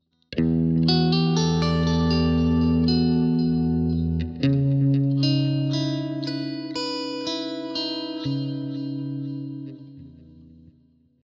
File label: Ambient Clean